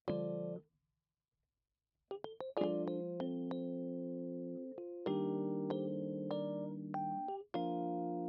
Rhodes

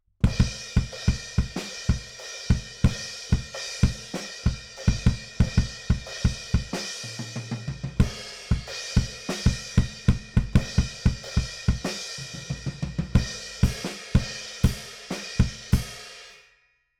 BD Out

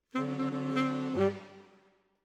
Alto, RNR for piano

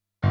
PIANO, RNR for piano

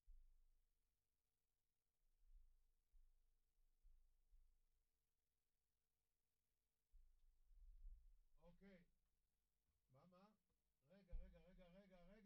Kick In

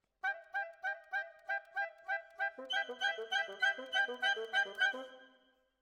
Oboe

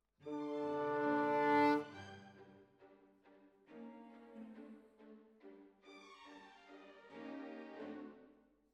Clarinet

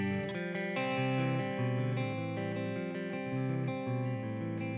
E. GTR AMP

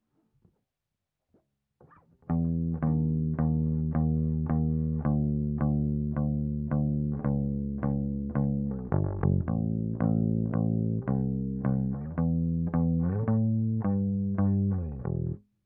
Bass DI

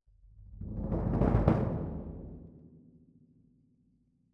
Cassa Close

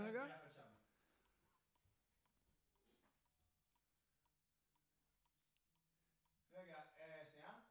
TB GTR

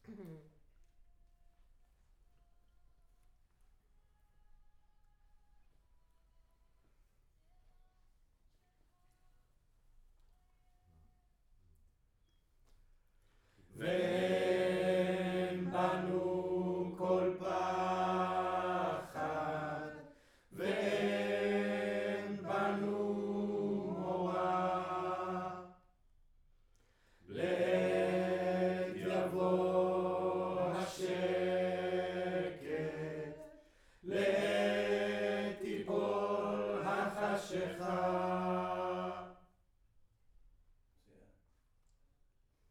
Room, Choir

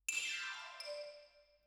Xylo

